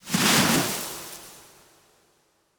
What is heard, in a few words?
Dragging Refuse Sack